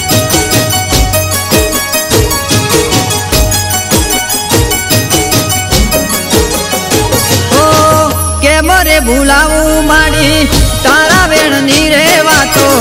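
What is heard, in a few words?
Gujarati ringtone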